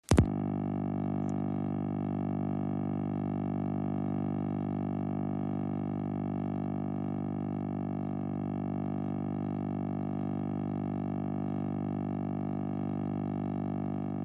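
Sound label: British Tel Dial Tone Ear, futz dial tones; foreign futz dial tones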